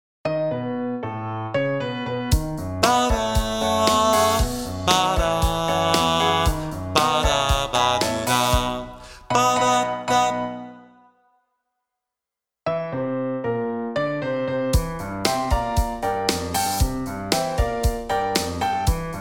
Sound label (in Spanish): SOPRANO, CALENTAMIENTO, SHOW CHOIR